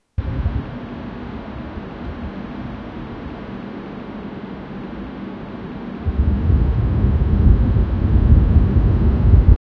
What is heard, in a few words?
wind blowing fast